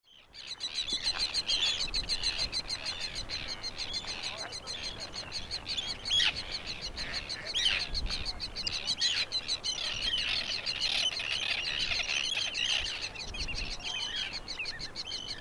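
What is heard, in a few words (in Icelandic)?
kríuungar við golfvöllinn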